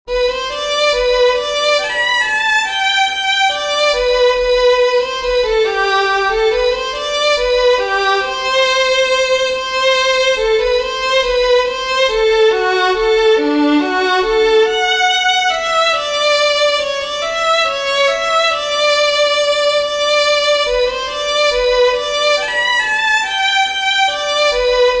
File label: Waltz, fiddlers; southern kings fiddlers